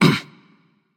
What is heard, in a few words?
grunt hit; smite; rama